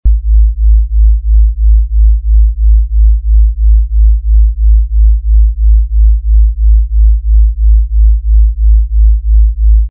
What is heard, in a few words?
sub vibration test sample